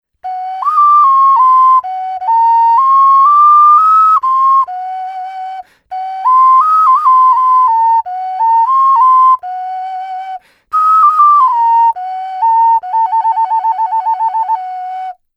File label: PreColumbian 4 hole bird tune, ocarina